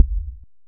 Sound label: impact Metal; Kenney's Sound Pack; Sci-Fi Sounds